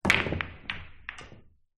Pool Break

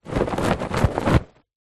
household pillow case shake

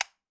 household switch light flick; sound effects one

